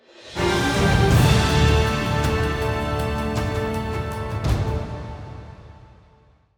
Stinger; Topior The Schrubbagazzor

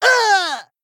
Male Gnome Death

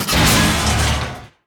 Animated Shield Death

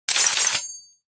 Daggers Play Underlay

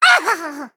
Male Imp Death

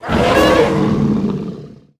Hoarding Dragon Death

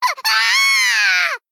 Female Gnome Death

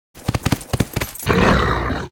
Felhound Mount Play Underlay

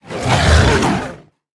Druid Of The Claw Attack

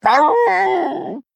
Male Worgen Death

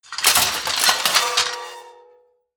Armor Type A Play

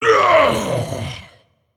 Male Pit Lord Death

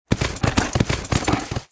Attack Underlay

